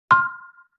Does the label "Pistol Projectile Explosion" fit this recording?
No